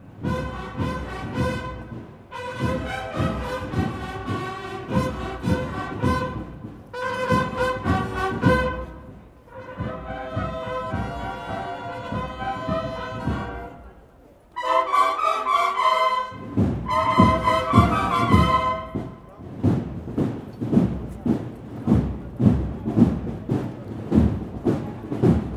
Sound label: Marching Band